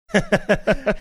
chuckling

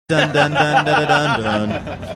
singing bassline of

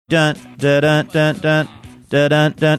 singing riff

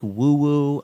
woo woo

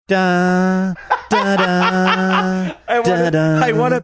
singing in the dark theme

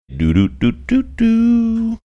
singing portion mcdonalds jingle

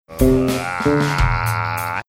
uuuuaaaahh

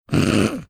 snorting

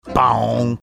imitating deep timpani sound; from jeopardy

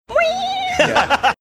imitating electric guitar

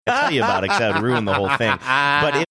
laughing to something was saying

